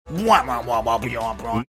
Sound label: imitating beat drop